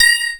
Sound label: A#, Synclav FM PERCUSSION; Big Fish Audio